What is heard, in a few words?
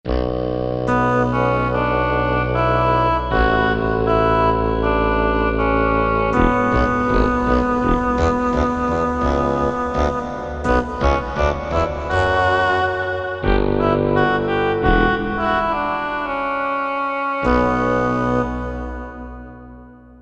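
Fagotto Oboe, Korg MSC-1S; Korg MSC-1S Variety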